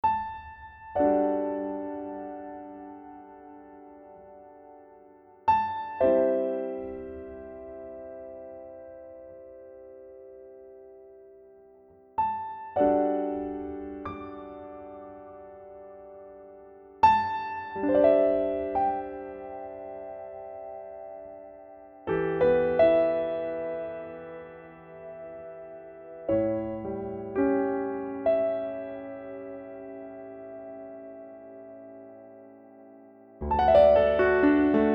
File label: Close Mic Jazz improv